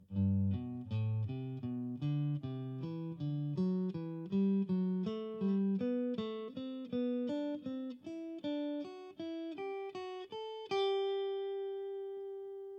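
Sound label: Major Ascending 3rd, guitar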